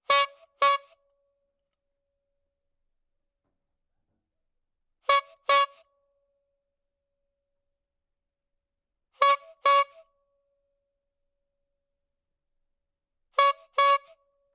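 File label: clown horn, interior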